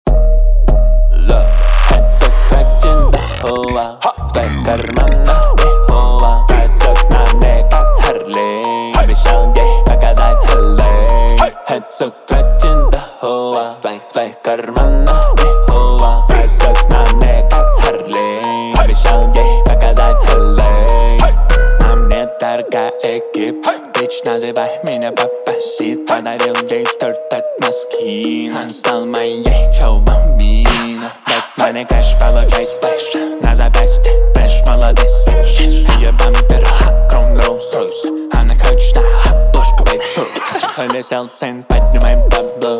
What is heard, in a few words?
Low Bass 37 39 41hz